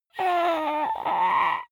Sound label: witch moan painful; kreaturen